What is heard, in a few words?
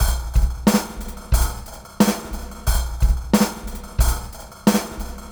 R&B Drums